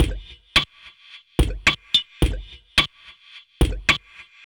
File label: Play Pipe, Drum Loops, Minimal; Zero-G Smoke